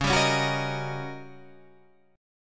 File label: D sharp 7th Sharp 9th